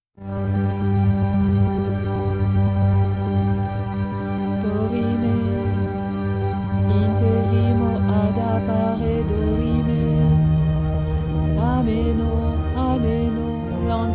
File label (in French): chanté